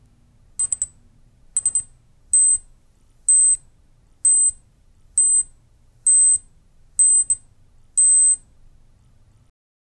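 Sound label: visor power on; neurallink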